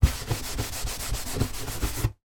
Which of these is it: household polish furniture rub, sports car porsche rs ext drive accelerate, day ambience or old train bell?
household polish furniture rub